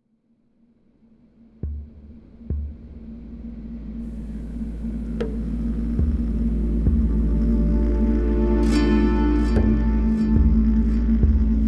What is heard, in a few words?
Transition; Ozymandias